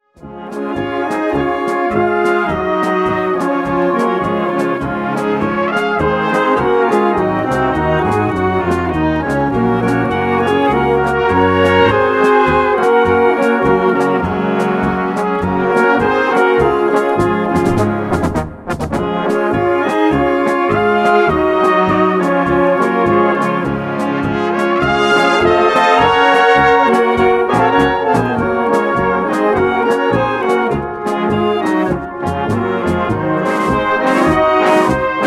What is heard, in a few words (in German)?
Blaskapelle